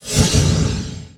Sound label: UI Menu UI ONESHOT; Monster Jam Steel Titans